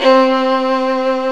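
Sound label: arco violins; E-MU Formula 4000 Series Vol. 5; Protozoa; Proteus2 Presets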